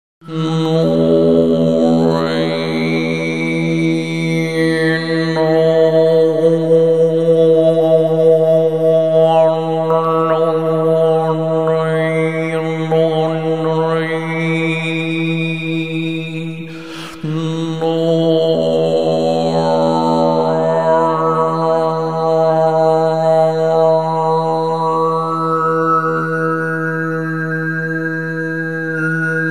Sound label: Amazing Grace Gesang